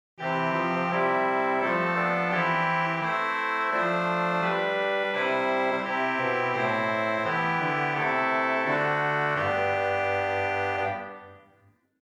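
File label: Swell Trumpet 8, organ; Hauptwerk; St Annes Moseley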